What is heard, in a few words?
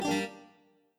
Instr Guitar; Backpack Hero